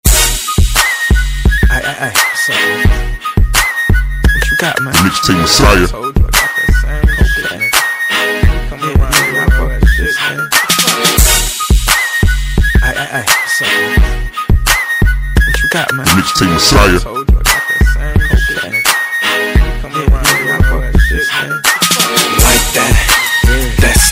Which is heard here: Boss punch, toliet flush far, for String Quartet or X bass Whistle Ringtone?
X bass Whistle Ringtone